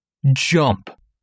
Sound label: jump